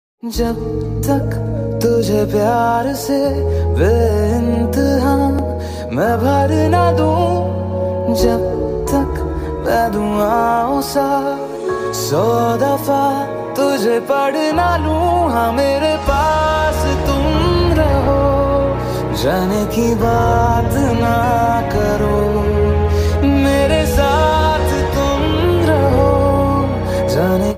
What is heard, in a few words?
ringtone call tone ringtone; ms dhoni